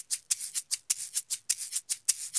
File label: Eggs 100, Drums & Percussion; Best Service; Festa Latina VOL-1